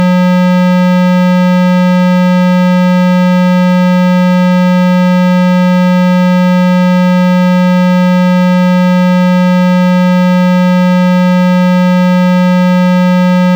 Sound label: ambient techno; MED; Robin